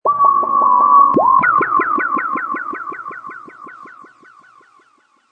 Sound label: High Evil Sound, high-pitched and creepy background sound; High quality stereo high-pitched and creepy background sound excellent for evoking fright and fear, perfect for horror-thriller genr